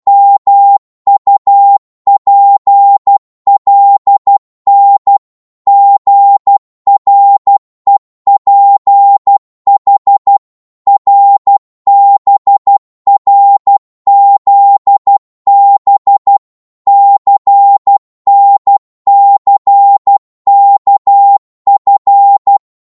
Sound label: 12wpm, Morse